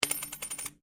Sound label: Coin Penny Single Spin Laminate; Common coins; Money; Objects